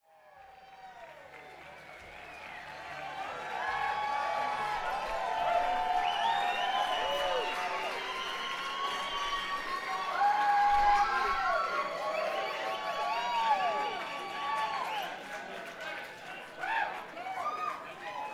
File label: Crowd/Tuning